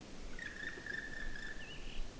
Pic noir cri de vol; Issaux

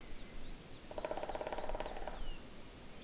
Pic noir tambourinement; Issaux

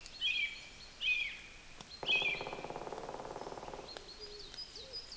Pic noir tambourinement; Issaux

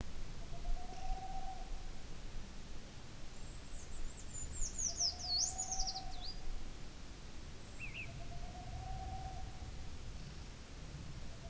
Grimpereau des bois et Chouette de Tengmalm; Aspe

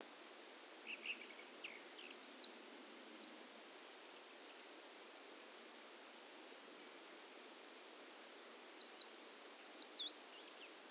Grimpereau des bois; Issaux

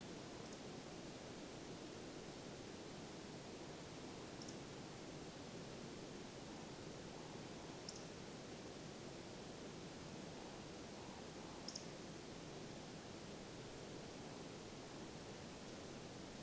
Bécasse des bois croûle; Issaux

